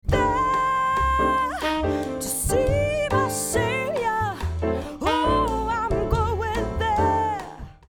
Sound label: Vox Meld